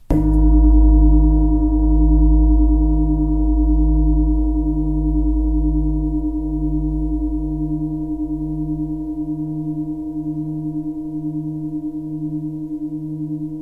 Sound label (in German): fussklangschale; blume des lebens